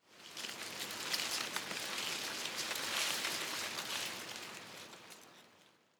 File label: tree small leaves rustle very soft